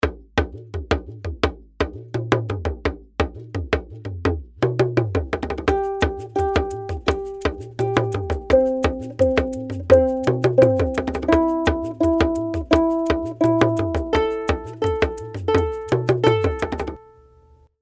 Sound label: Going Down the Lines SLOW Tempo WITH UKULELE; Rainbow Ukulele Play-Along